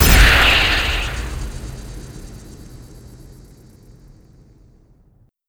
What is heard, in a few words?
bfg fire; tf2classic